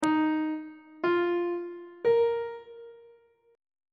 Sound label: d sharp suspended 2nd triad chord intervals; on treble clef